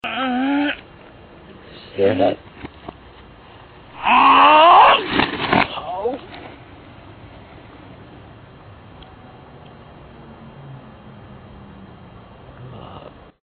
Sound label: fat jump, reverse